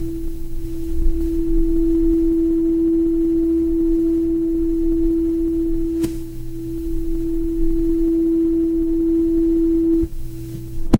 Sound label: ambience room tone